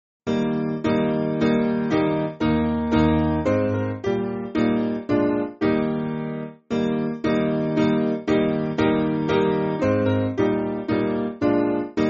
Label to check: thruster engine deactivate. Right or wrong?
Wrong